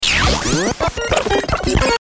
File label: Stinger Radio Warble Sweep; Stinger Radio Tuner Sweep Warble; Production Element Imaging Element Accent Transition